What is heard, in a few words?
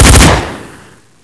glock18; weapons; c-strike